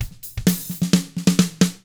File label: HRDRK, hard rock drums